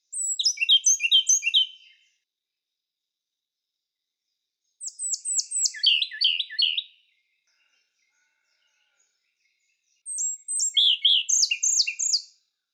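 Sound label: Japanese robin song, birds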